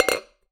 Ricochet Glass, Medium Wine Glass

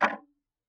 Tick, Clocks Kitchen Clock

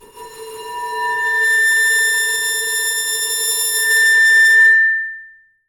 Bowed, Large Wine Glass

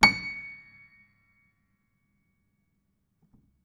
Note High, Piano; Piano Miscellaneous

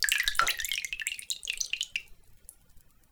Dribble, Water Dribble

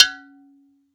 Flower Pot Hard; Flower Pots

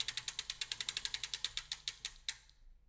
Back Pedals, Bicycle

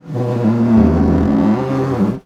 Snare Drum; Percussion Samples

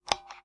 Taxi Meter

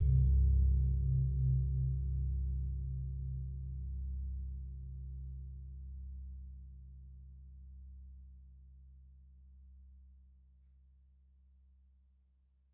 Suwukan, Gamelan

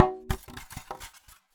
Polystyrene Rattle

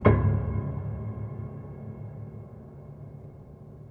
Hamm Perc Ped, Piano Hammer Perc Ped; Piano Miscellaneous

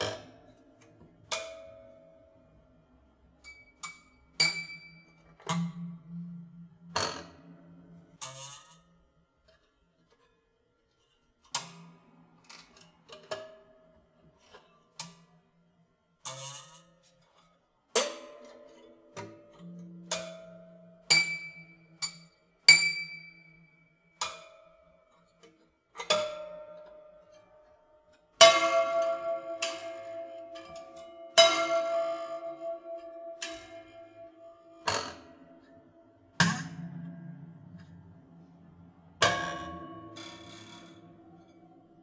Flick, Piano Destruction

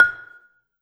Gambang Hard Beater, Gamelan

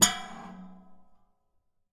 Classical Guitar Buzz Pluck; Classical Guitar Extended Technique Stereo

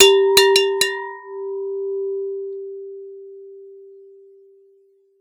Water Clinks Mono, Glass Clink In Water

